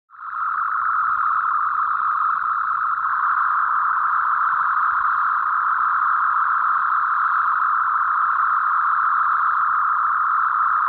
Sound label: Electronic signal